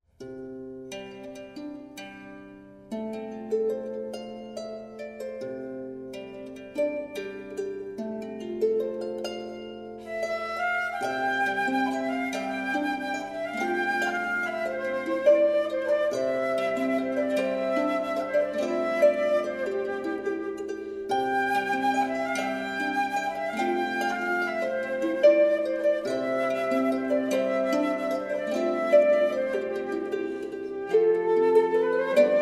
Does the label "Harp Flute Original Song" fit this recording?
Yes